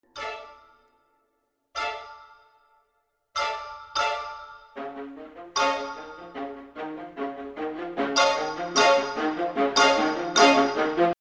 orchestration doublings xyl violins, Violins + xylophone; epic orchestral track